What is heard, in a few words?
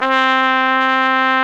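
Trumpet f, Solo Brass; AKG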